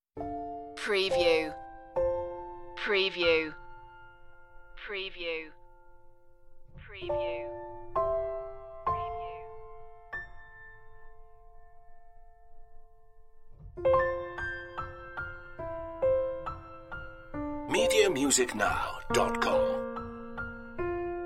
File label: piano only